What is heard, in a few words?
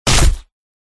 human punch smack hit; zapsplat